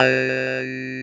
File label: SYN, Drum & Bass, 175 BPM; Ueberschall; Drum & Bass Flash Trackz